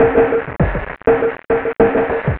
drloop, drumloops, Vinyl Attack; Masterbits

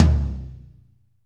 TOM, Toms, Roland; Northstar - Drumscapes Roland

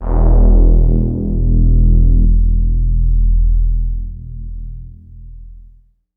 Strictly Synthe, Excessive Stereo; E-MU Producer Series Vol. 6 – Analogia Project

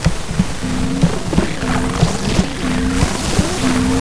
Tabla, 120 BPM; Zero-G; Pure Tabla

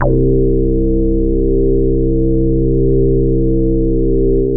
KT76 REZO, SYNBASS; Synthesizers; Soli-Music; World of Synthesizers VOL-1

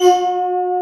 metal pad, electric

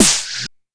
ice snare; Polyend; Legowelt

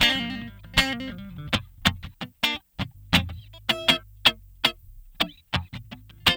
GUITAR; East West; Funky Ass Loops

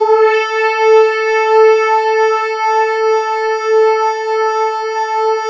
brass lead (A); Sonic Foundry (Sony Creative Software); Ugly Remnants VOL-2

